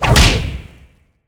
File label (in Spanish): attack con fuego; shadow champion